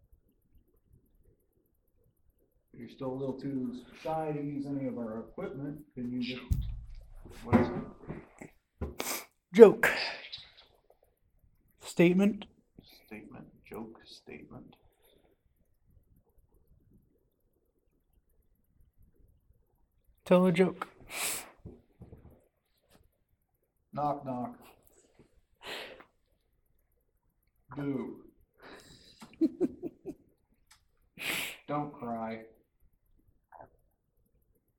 Basement EVP; american renaissance church investigation

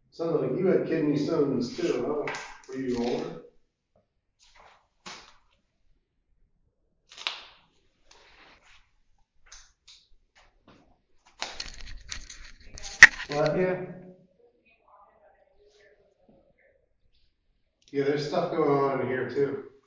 Morgue EVP; fairfield county infirmary return investigation

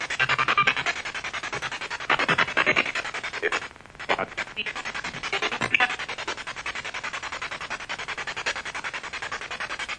Spirit Box; 2nd Floor Bedroom

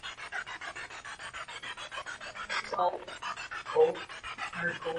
Basement Spirit Box; gill house investigation

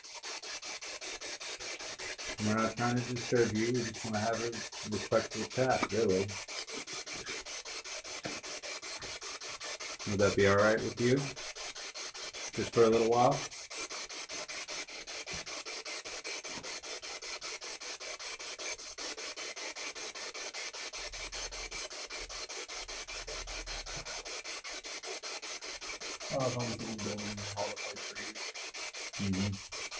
Basement Spirit Box; judson house investigation

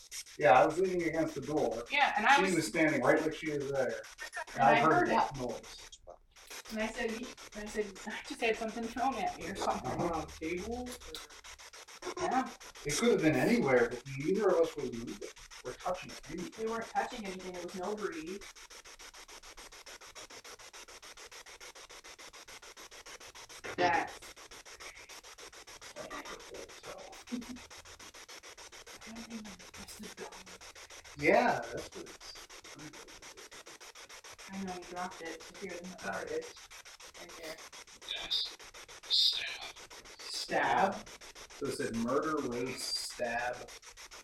Indian Head Room Spirit Box; wexford general store antiques investigation

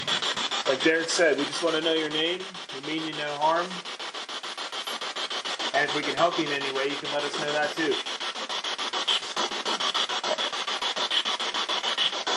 Spirit Box; Basement; wildwood sanitarium investigation

